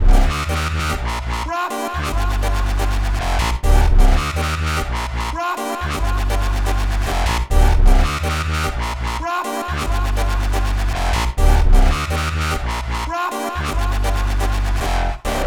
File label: Elements G Full Mix, Complex Electro Synths; Prime Loops